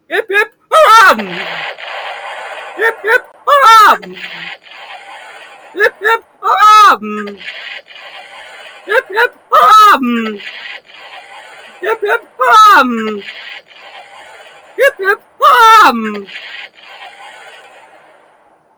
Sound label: hip hip hurrah boom